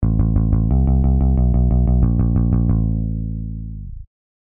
eq test bass

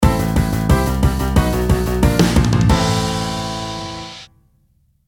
eq test total

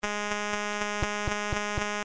dpcm

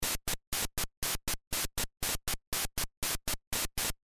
dpcm

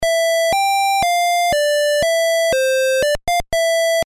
dpcm

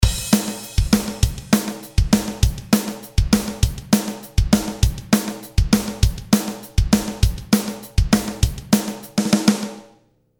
delay

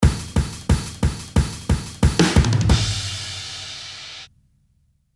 eq test drum

